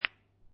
Billiard Pool Snooker Two Pool Balls Clacking Together